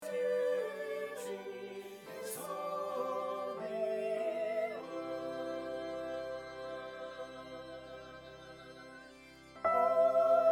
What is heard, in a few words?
hark descant